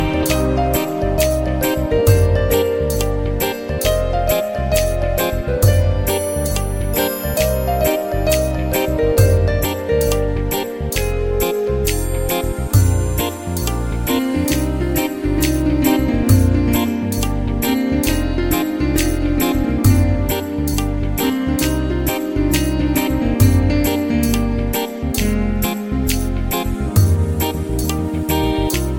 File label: Love Tune